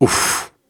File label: Grunts and Chants, Viking Vocal